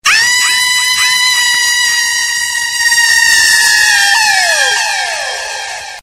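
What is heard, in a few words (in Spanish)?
gritos de horror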